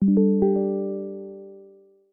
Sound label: Boot Up; Microsoft; Windows 10x